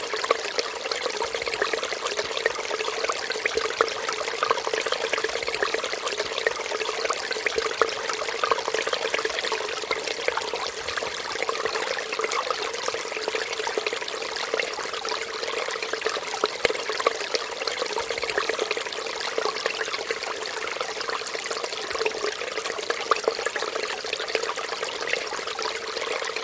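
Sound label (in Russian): небольшой поток воды стекает в озеро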